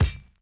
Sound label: Bounce Sword Large; Going Under